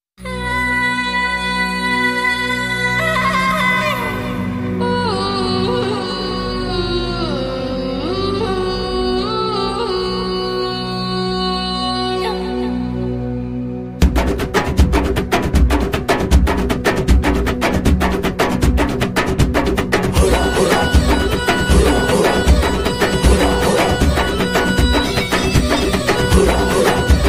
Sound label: movie ringtone rap rock; darja movie; anasuya bharadwaj; sunil